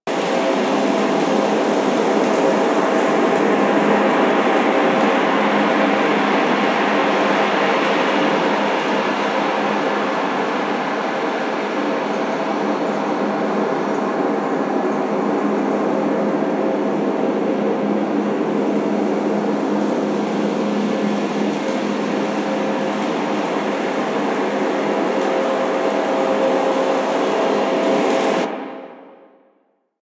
Galleon Interior Amb

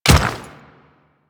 Dirt Step, Footsteps; Royal Guard

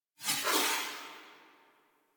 SFX Screen Swipe; Warframe; Parvos Lich Intro

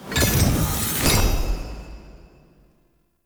UI Crafting Confirm

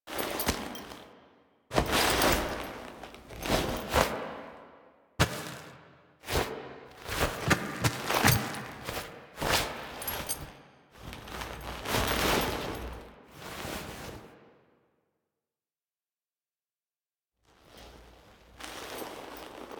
Foley Tendrils; Cinematics; Drifter; Warframe; Duviri Quest; Courtyard

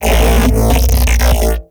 Mech Ambient Vocal

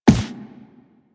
Run Default, Footsteps; Warframe; Operator

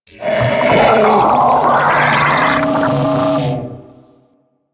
Death, Infested; Enemies; Barks; Warframe; Ancient Shell Agent

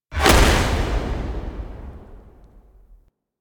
Queens Chamber Land Stinger; Warframe; The War Within

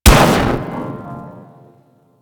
Electro Mag Explode; Warframe; Corpus

